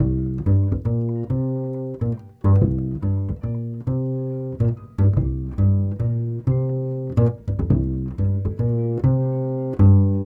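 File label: 140 Trad Jazz Doublebass (3-4) C major; Pro Tools Essential Loops; Digidesign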